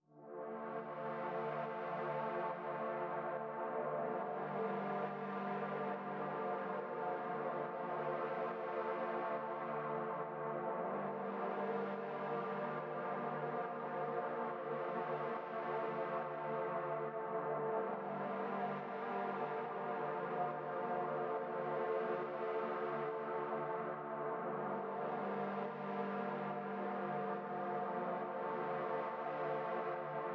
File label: 140 BPM D Min Pad, Drill; Melodies; Loop Stems; Armed